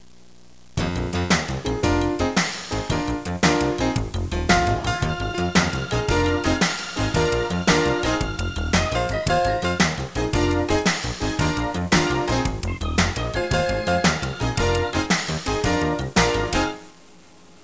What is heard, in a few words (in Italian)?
musica newage